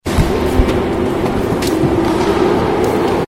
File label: metro frankfurt; fieldrecs